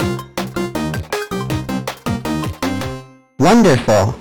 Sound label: victory